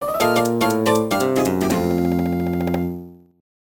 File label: Music Mario Vs. Luigi - Lose; NSMB